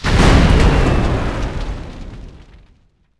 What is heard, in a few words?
Plane Die; Civ4 warlords